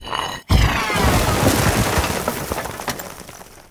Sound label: portal destroy